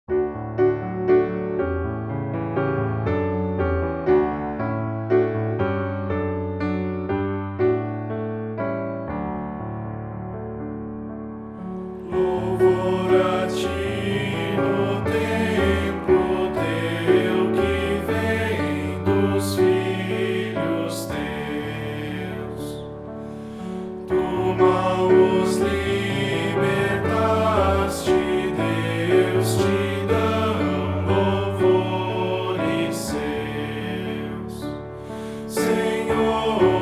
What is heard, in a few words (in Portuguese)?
voz piano